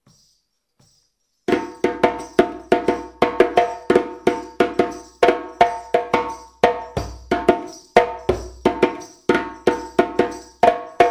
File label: Kanin, djembe